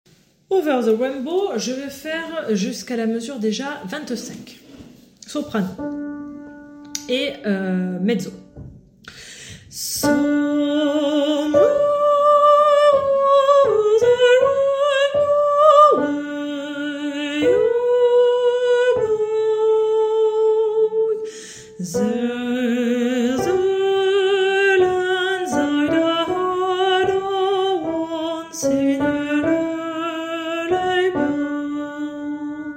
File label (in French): Soprano et Mezzo